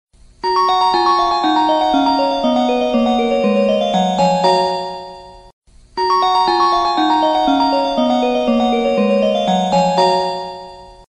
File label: morning notification